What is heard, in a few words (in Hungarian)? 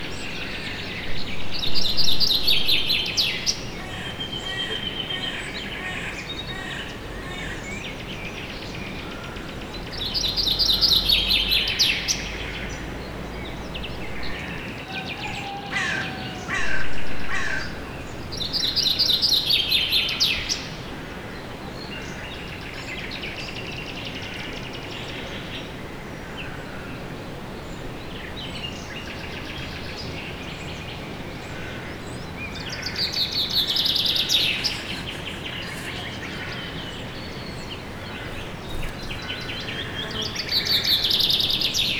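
balra varjú szellő esti tenger madarak; Skala Potamia; görögtáj Thassos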